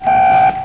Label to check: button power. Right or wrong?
Right